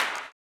CLAP, Blues Soul; Spectrasonics Vocal Planet

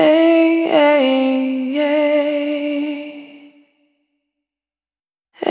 Single Voice Melodic Loops

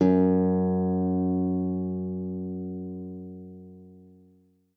F#2, guitar; Xsample VOL-2

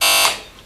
Drillow; Total Drum&Bass; 90 s Sample CDs; Hits & Bits

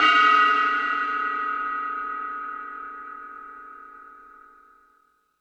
Metal Drums, E-MU Pro-Cussion; drum machine

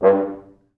Fr Horns stac

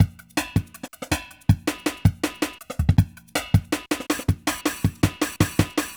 drum, Drum & Bass; Best Service ProSamples vol.03 - Drum & Bass 1; AKAI

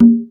Percussion, House

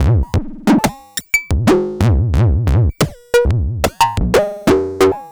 FM Funk, Analog Glitch; Polyend; Stazma & Concrete Collage

